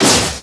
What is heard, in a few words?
ROCK